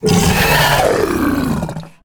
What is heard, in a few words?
Emberscale Drake Death